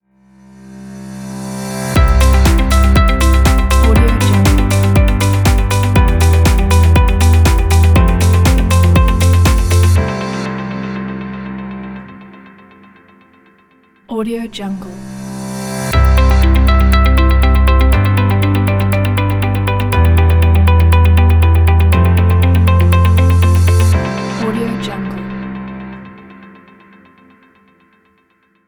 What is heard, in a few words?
corporate logo intro